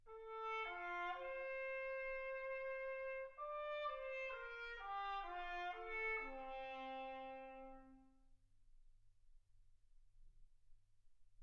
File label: trumpet